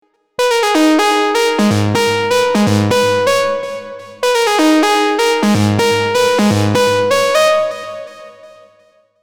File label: Lead, Techno; Special; Unison Techno Collection for Serum